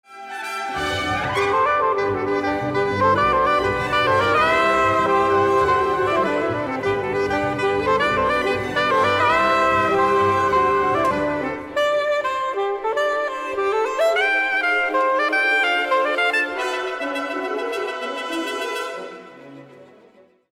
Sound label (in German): Konzert f Sopran u Altsax u Orchester